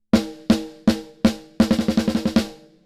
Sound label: snare